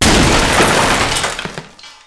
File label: tanker death